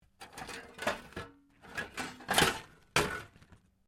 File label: METAL SCRAPS MOVE